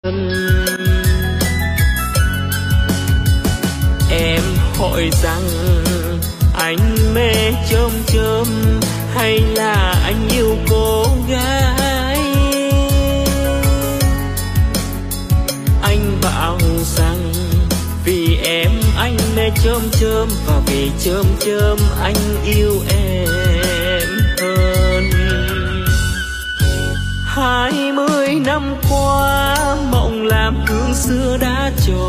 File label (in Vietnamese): Bolero Nhạc Vàng Xưa